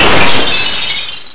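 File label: windscreen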